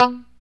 POP B3, STRAT guitar; Spectrasonics; Hans Zimmer Guitars VOL-1; JC-STRAT POP

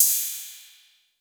808, CYMBAL; CYMBAL P2

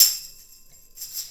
Tambourine

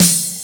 CRASH; USB Soundscan vol.27; FILLACOUS

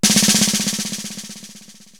SD Orch. Roll, Korg TR-Rack drum machine

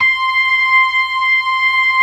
ORGAN LOWER MANUAL

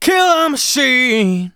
vocals sung F#; Techno Trance Essentials

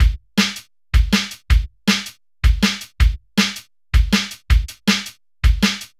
Basic Beat, Drum N Bass; Sonic Foundry (Sony Creative Software); Knutrix Drum & Bass

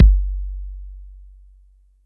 BD ELEC, Yamaha DTXpress Electric Kick

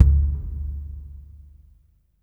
WD L, DRM HITS; Spectrasonics; Liquid Grooves

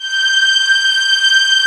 VIOLIN G 5

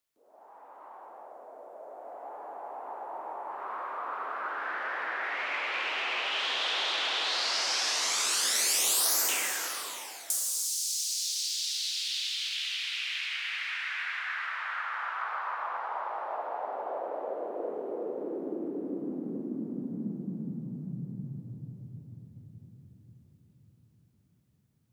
PWM Kit 142 BPM Fx Sweep; Vengeance - Essential Clubsounds Vol.5; Demo Constr Kits; Root Gmin

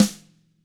TAMA SNRD; ILIO; Double Platinum Drums 1

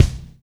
Reg. Kick; Roland BK-5

